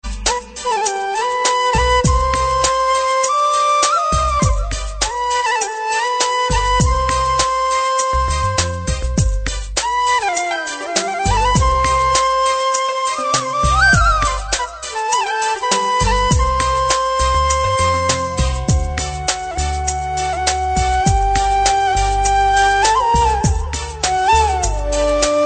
romantic malayala

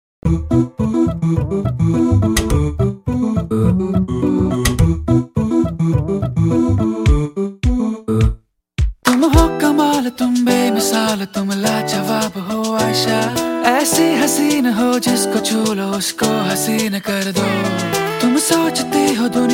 ringtone

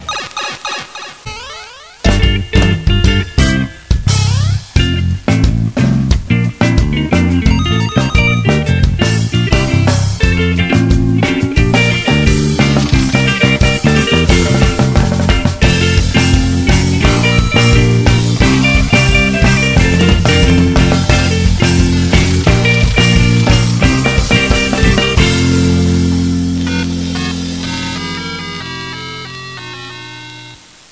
ring; mario bros

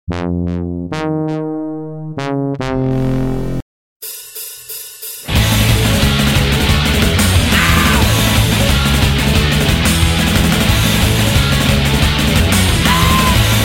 loud tone; batman

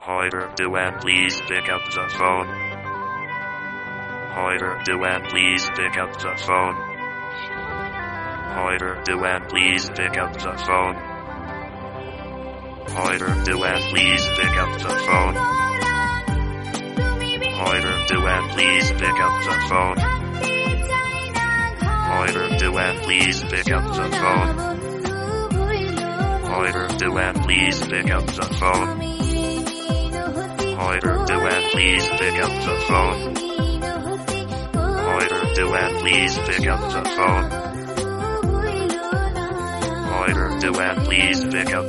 ring ton

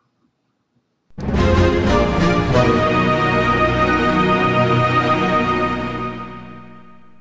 obtain key; megaman legends 2

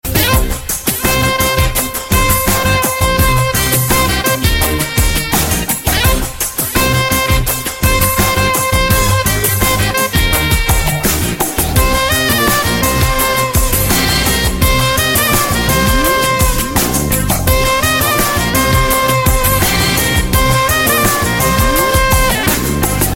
sax; cheeni kum

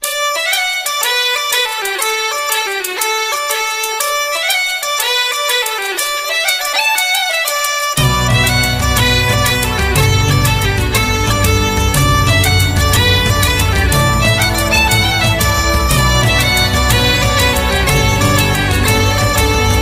theme ringtone; boondock saints

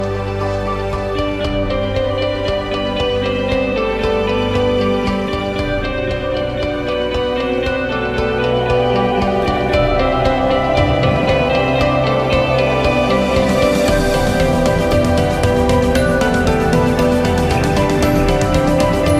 theme song; riverdale

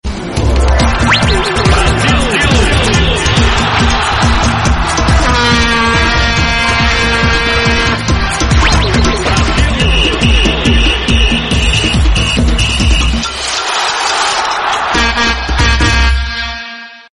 vuvuzela

